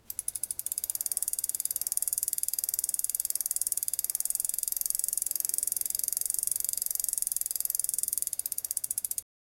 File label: bicycle chain clicking